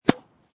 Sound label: Tennis Hit Ball with Racket Close; Tennis Sound Effects; Tennis (Sound Effects:Sports)